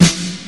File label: hot snare E key, acoustic snare sound tuned to the E note